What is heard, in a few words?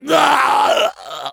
pain severe; Soldier